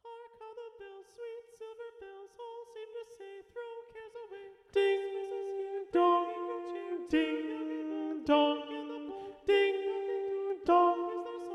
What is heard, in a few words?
Carol of the Bells SATB Alto Predominant